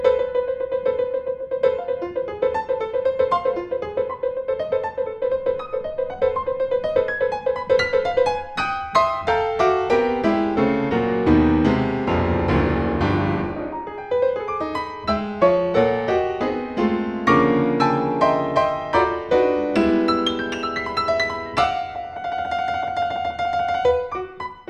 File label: Ballad